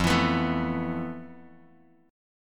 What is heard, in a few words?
F Major Sharp 11th, Bass Standard; strummed; JGuitar